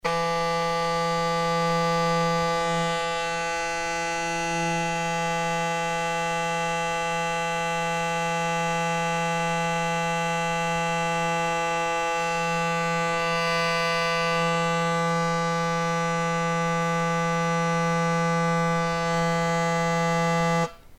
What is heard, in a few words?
shaver